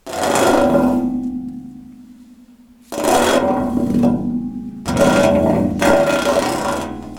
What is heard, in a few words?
Radiator Metal Fingernails Rumbling